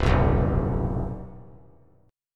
C Minor Major 7th Double Flat 5th C down 3C 3F 3A 3D; strummed; JGuitar